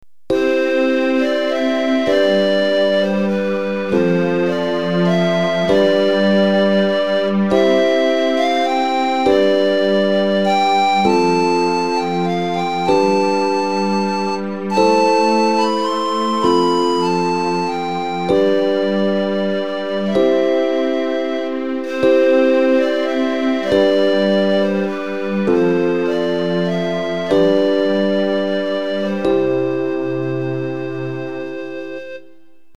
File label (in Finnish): Kappale pienorkesterille